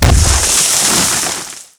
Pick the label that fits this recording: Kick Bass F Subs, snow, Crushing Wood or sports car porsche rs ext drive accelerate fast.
Crushing Wood